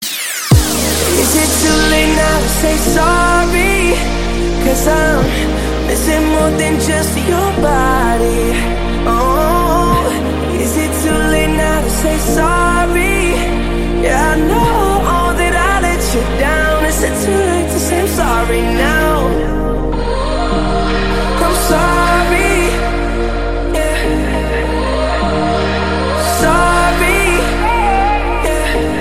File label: remix ringtone